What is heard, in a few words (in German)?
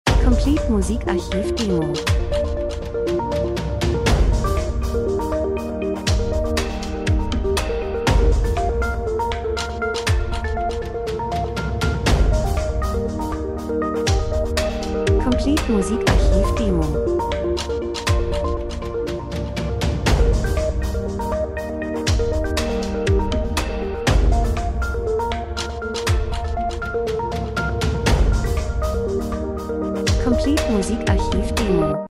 drums, rhythmus; Sequenz Klangwolke,Gefühle Wohlsein Emotion Gleichmäßig Liebe Natur Schlagzeug Synthesizer; Stimmung